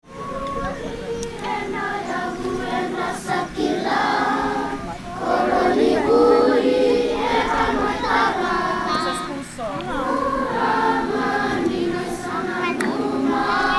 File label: School Days, fiji